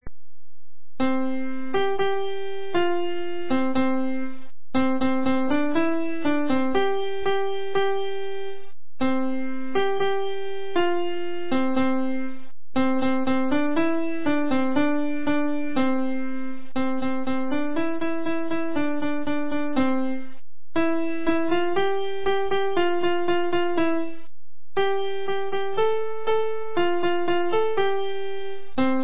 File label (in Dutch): liedje piano